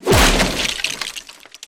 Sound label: Crates n Boxes, destructibles